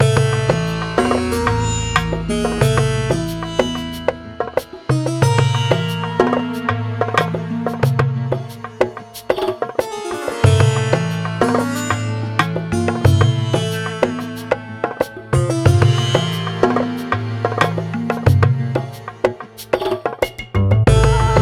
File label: Desert Loop; Sparklite